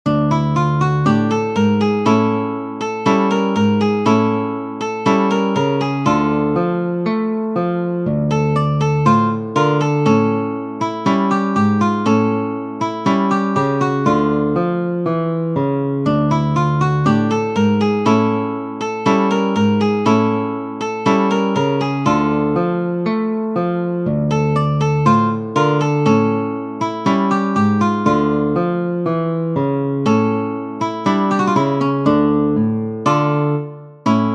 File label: Tango Criollo, guitar